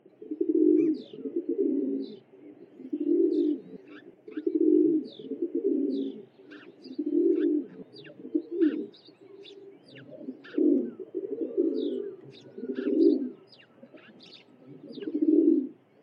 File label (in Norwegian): BYDUE graaspurv